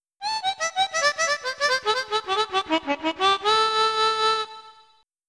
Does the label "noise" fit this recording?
No